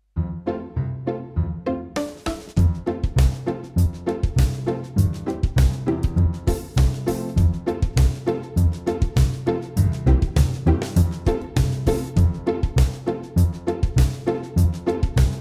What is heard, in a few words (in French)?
un kilometre karaoke